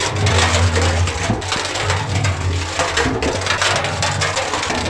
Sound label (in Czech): Voda